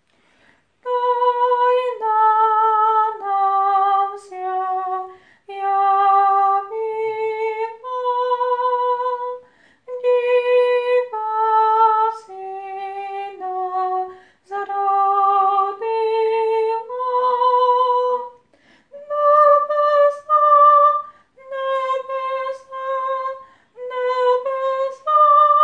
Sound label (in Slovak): tajna sopran